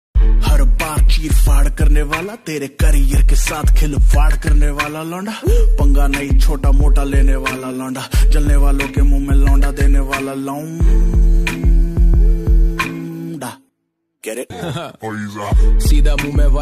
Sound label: rap ringtone